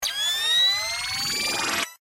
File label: remote timebomb charge; Original TK Sounds